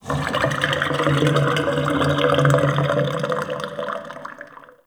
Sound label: Galleon Pipe Gurgle Ambience; Warframe; Grineer Galleon